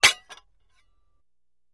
Axe Clinks, Dull; Single Medium Clink With Metal Scrape. - Metal Scrape